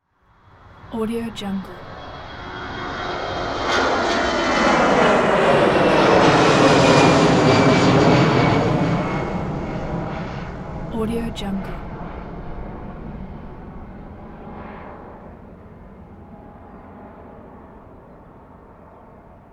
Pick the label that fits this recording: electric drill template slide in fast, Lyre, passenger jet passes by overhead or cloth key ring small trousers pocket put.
passenger jet passes by overhead